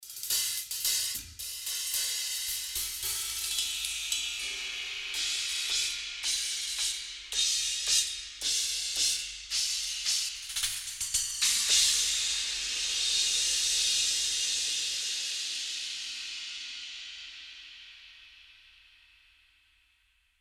cymbals; frostbite orckings metal kit; klangmacht

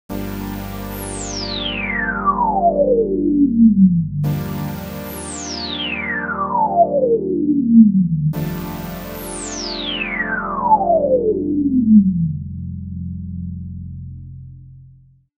SVLPF24 MAX Q sweep synth

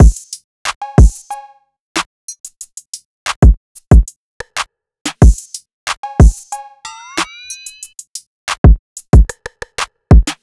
drums; factory; player vst; sound selection kits

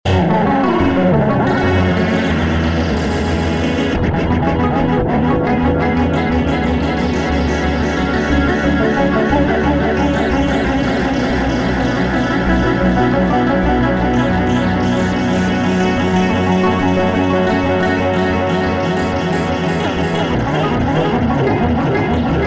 analog performance wet; soliton; chiral audio

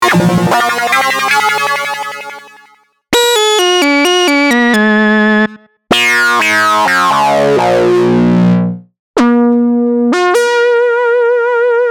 lead; cherry audio yellowjacket

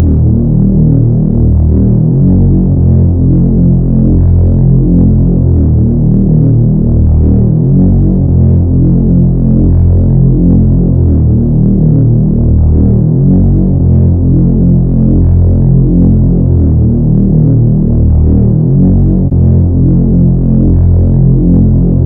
bass dry; folda; sentinel av

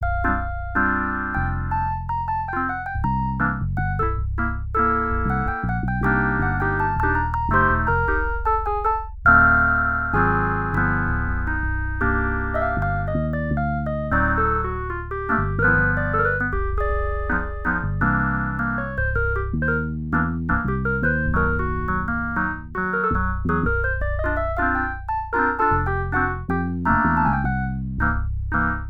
bass epiano; agl vst customs

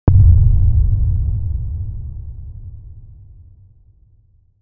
boom; the ghost

